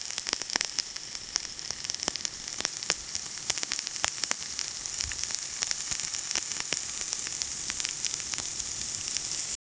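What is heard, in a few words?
sizzling meat from the grill; appetizing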